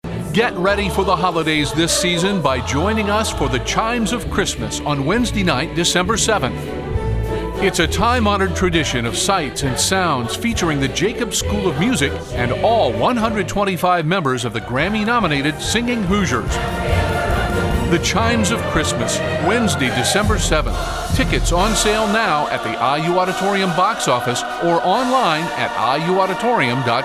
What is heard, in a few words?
chimes